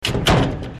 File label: door